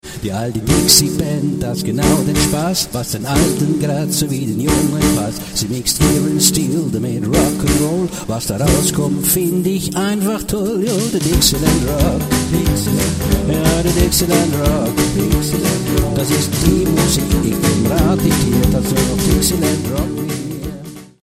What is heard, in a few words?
dixielandrock; Played on the keyboards: Wersi Pegasus, Yamaha PSR 8000, Tyros 2